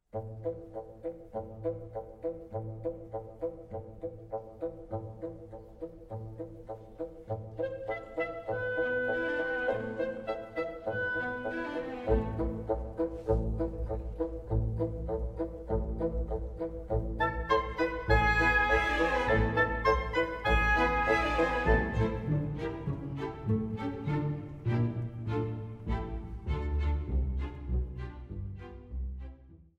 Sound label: piano